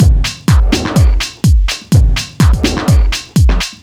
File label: perc